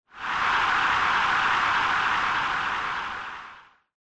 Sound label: SNES Baseball Cheer